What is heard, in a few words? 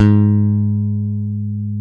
G#2 PICK, bass; AKAI S-Series CD-ROM Sound Library VOL-8